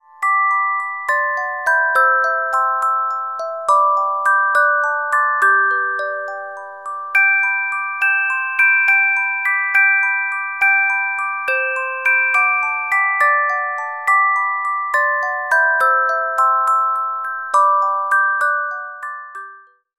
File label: Carillon Music Box Melodies